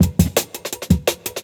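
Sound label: Jungle Breaks, 167 bpm; 90s Sample CDs; Original Jungle Breaks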